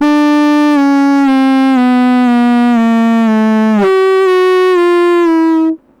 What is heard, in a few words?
sax down